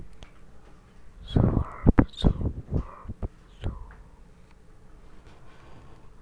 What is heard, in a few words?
stab